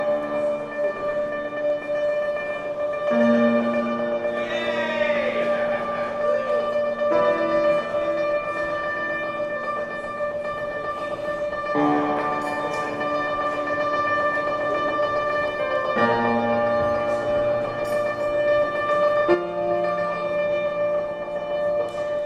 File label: Oscillating Innards, NoiseFest